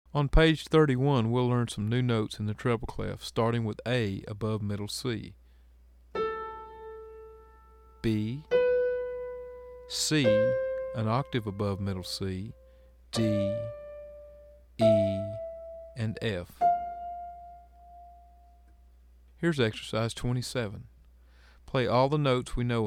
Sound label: Chords